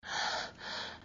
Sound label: Breath; Lewd SFX